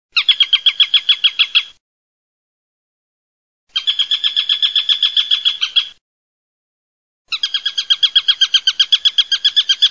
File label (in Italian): SPARVIERO